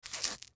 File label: MedicalScientific; Soundfx III